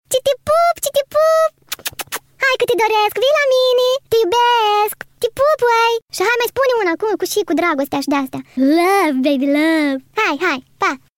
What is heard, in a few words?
bzyk sound like